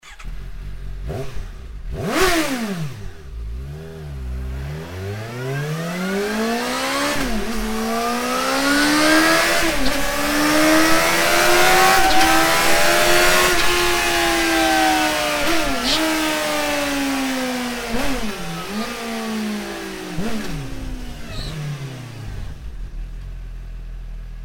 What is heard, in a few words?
slip on exhaust suzuki gsxs750; akrapovic